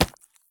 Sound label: sprint gravel; Escape from Tarkov